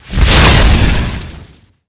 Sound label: Dragon Skill